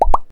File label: Charge